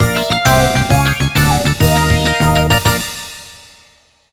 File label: Goal; Kororinpa